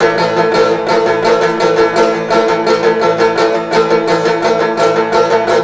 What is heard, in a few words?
banjo